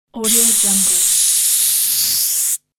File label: short puncture air leak